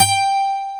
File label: ACOGTR